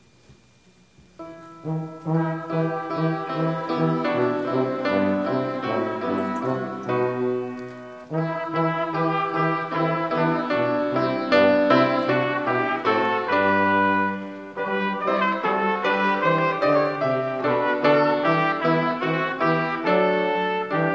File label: brass ensemble offertory